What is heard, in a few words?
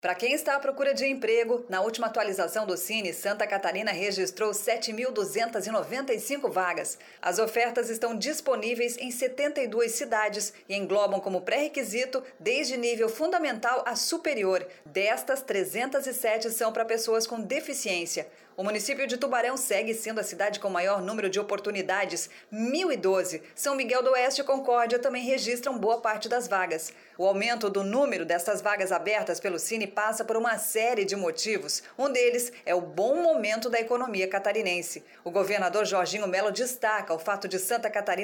Sine